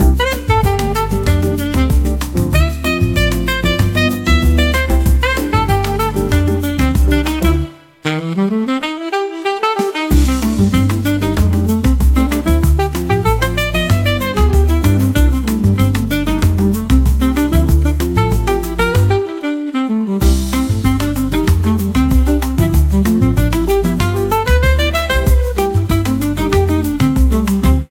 Fast Pace, phonetones ringtones

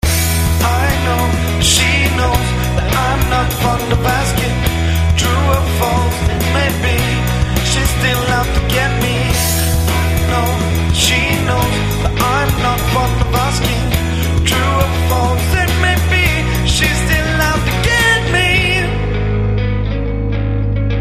naive chorus part, ringtones; Arphiola ringtones; Soundalikes and covers

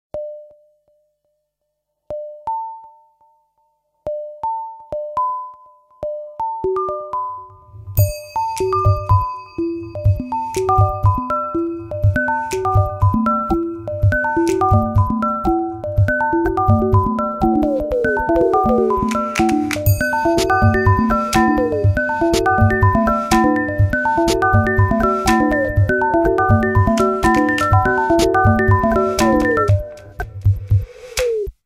Nokia Tones; Liquid; Windows Phone apps

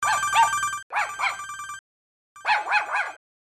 Morning Walk; LG CX265 Rumor2

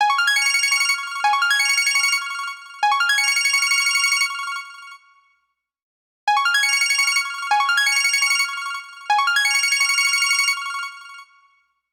Chirp Ring; LG

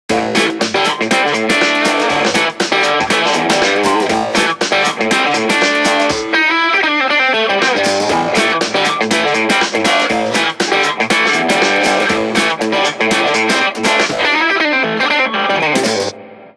Windows Mobile ringtones; Individual download-only (2009); Microsoft

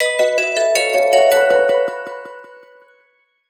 ringtones; Gentian; HTC One M8

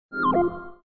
Vol down, Event sounds; KB775 Scarlet

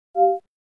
information, Nokia 808 PureView

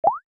call mail; LG; E500; LiveSquare EffectSounds

